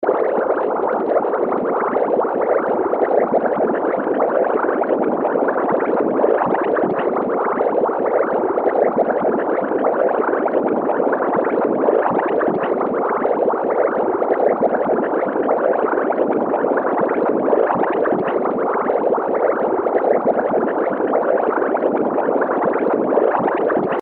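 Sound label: liquid bubbling layer smooth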